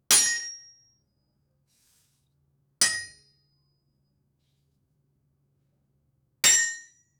asmr, concrete

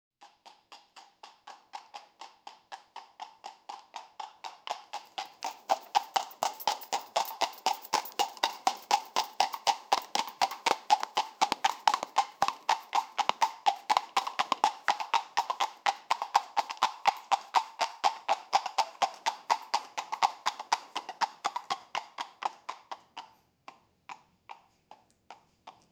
equipping a horse with

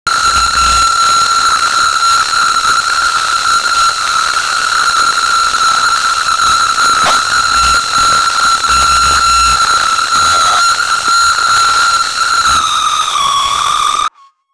a loud glitch bomb that, explosions

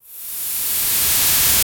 the mystical sound of the

